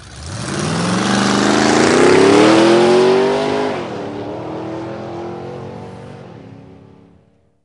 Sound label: race car; 1000fx